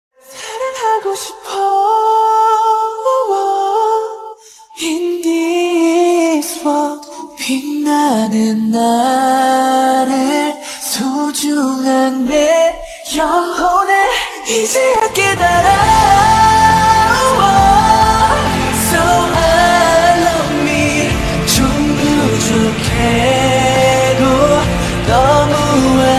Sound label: korean ringtone